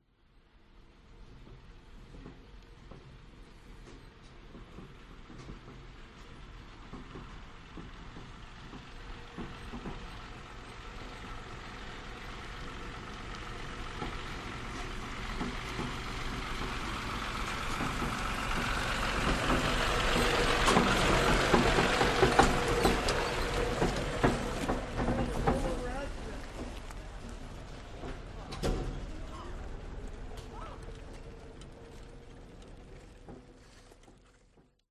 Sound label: tractor & wagon pass by farm usa west country hay people